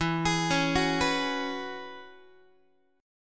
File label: E 6th Add 9th E, Guitar; arpeggiated; chord; x,12,11,11,10,10